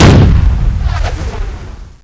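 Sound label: shotgun, weapons; xash3d fwgs; dmc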